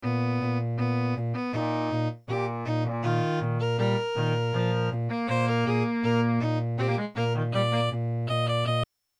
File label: music